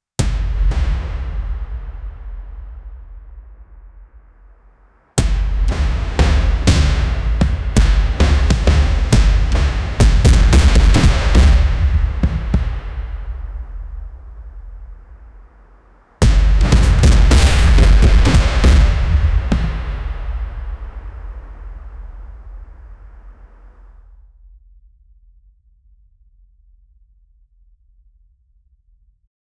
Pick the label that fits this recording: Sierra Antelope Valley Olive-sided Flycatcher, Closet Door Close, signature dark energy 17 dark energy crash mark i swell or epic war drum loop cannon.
epic war drum loop cannon